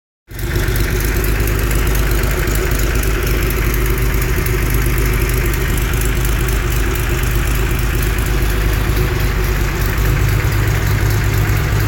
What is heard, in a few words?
header tick engine; 911 turbo 930